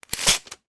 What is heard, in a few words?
industrial tool tape measure pull short length out